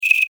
Insect Voice Chirp, Insect, Voice, Chirp, Vocalization, Bug; Sound Effects:Animals:Insects; Blastwave